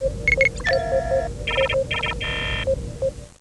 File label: computer beep sequence